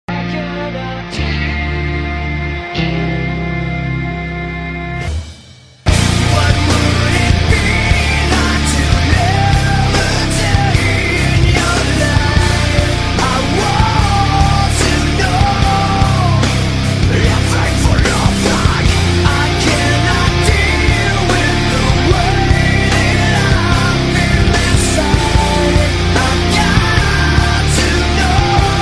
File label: Metalrock Tone